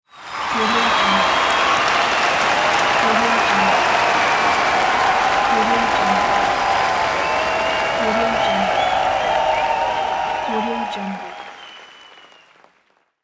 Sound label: large crowd cheer applause